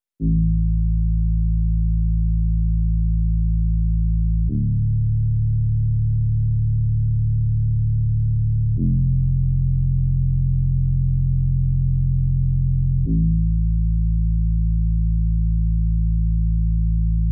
Drum and Bass

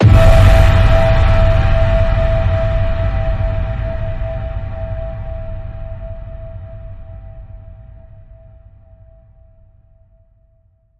Ping Pong Passion, E

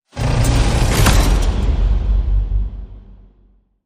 Behind You Stutter Door Slam

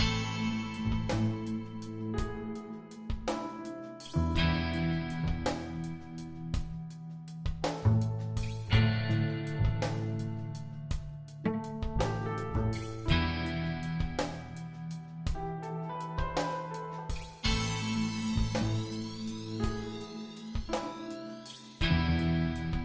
Slowly Bass

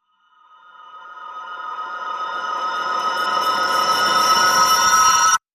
Rise Close Encounter

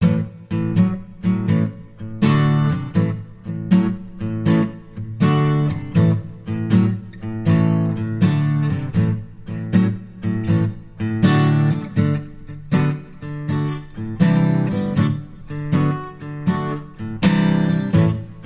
Front Porch Blues

